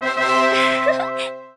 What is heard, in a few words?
Victory